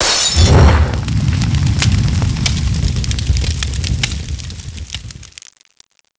molotov, grenade

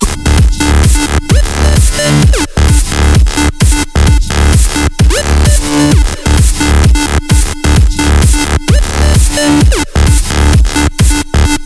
zm dnb

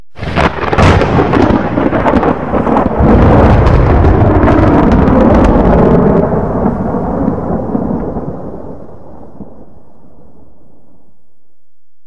storm ef; cstrike; zombie plague

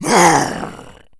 zombie attack, claw attack; zombie plague

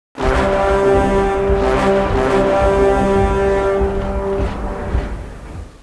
Ready ultimate; cstrike; War3

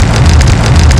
rolling; zbs

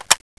ak47 clip in

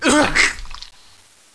pain

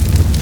fire med loop; cstrike

